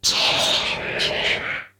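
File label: eye attack; doors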